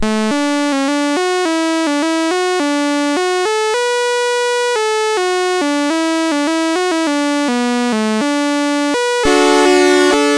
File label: BASIC, CSG8580R5; Gammelt Venskab